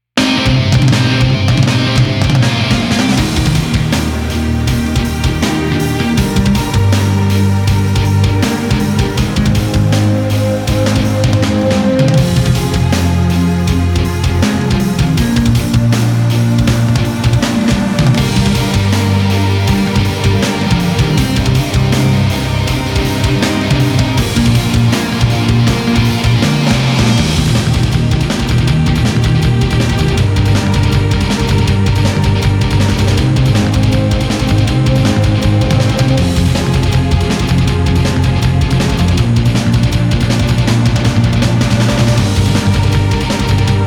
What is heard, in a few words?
Epic Speed Metal, No Choir; Gothic Storm Music